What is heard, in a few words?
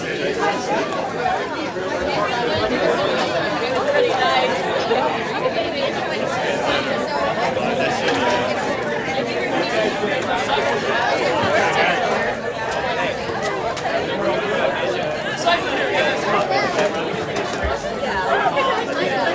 crowd outside, ambience sounds